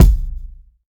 Kick Punch

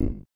Button

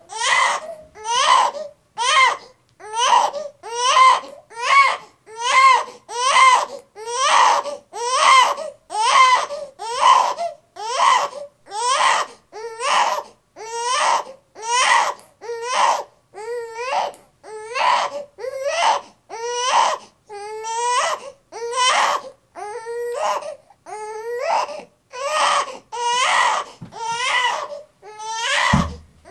Baby Crying Long

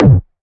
Hard Kick

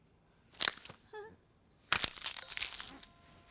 Finger Break

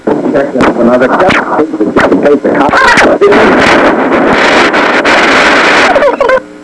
Warped video

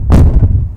Footstep Strong

